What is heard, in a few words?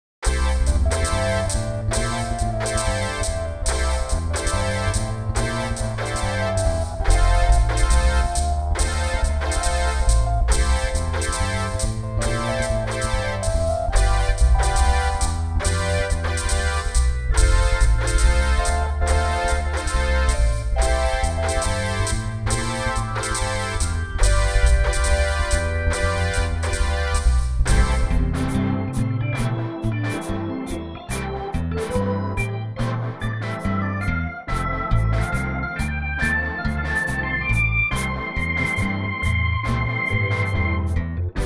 MIDI blues; spellman studio